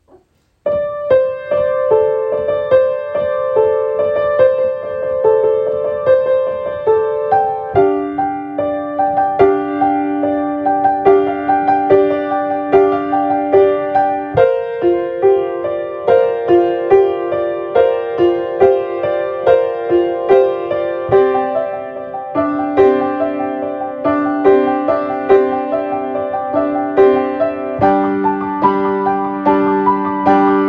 Chimes

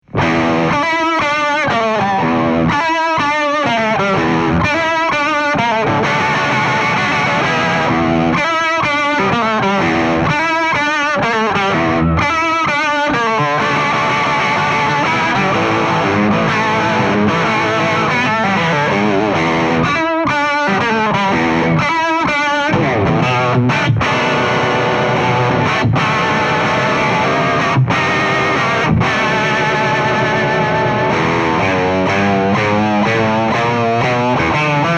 Les Paul, Sine, Swamp Rock

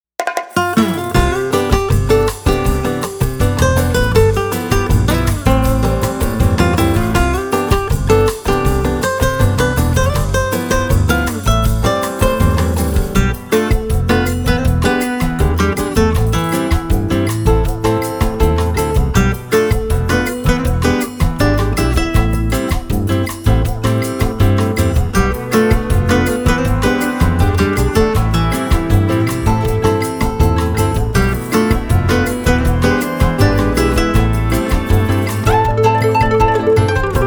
Sunlight Bossa Nova

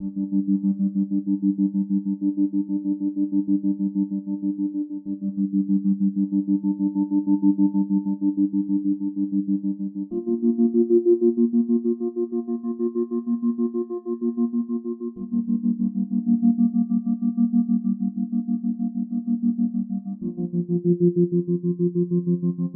contemplative, cerebral, hopeful; composed for MANMADE EARTH, dir. Michael Silverstone & Abigail Browde; Invisible Dog Art Center, NY 2019

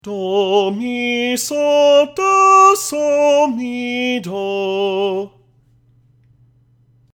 Scale Tenor

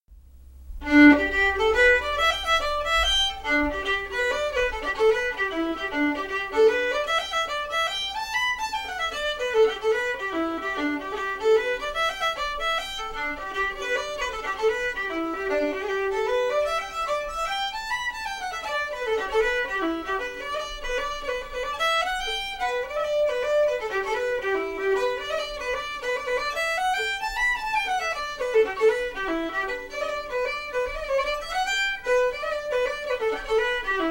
Boys of Ballisodare slip jig, Fast

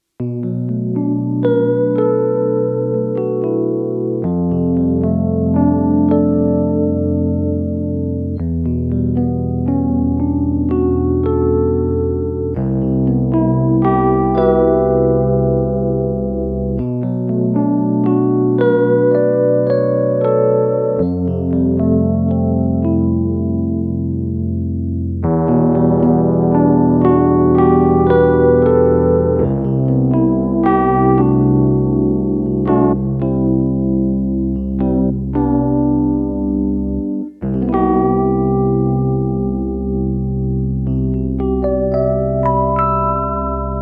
Fender Rhodes; EQD

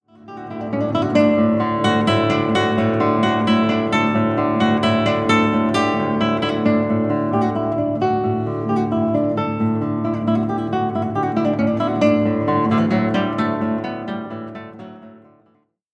Lute Pieces Saltarello

